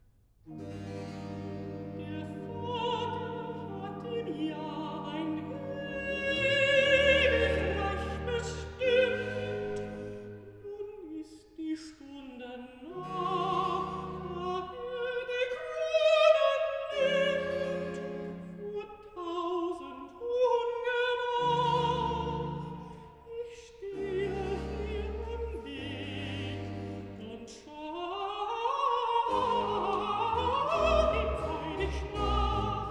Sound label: Recitative (alto); Johann Sebastian Bach; Gott faehret auf mit Jauchzen BWV43; Archiv